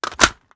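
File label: assault rifle reload mag in; gmod; viper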